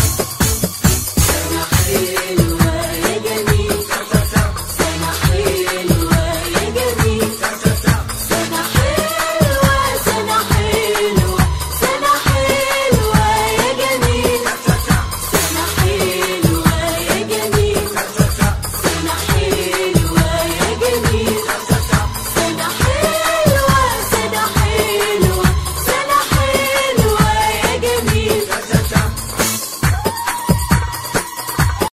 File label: birthday arabic